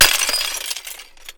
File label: Window, Small, Break, Medium Impact, Large Amount of Clinking Pieces; Shards Broken Glass; Chris Skyes